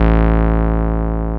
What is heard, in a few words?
303 SQR A1, ROLAND TB303; Big Fish Audio; First Call Vintage Keyboards